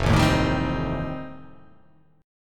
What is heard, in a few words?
F 9th F, chord